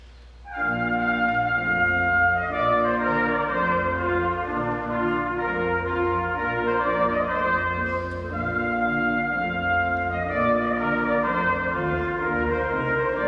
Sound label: Trumpet Tune; Purcell